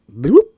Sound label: bloop